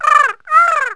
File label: bird; Wired for Sound Pro CD; Aristosoft, Inc.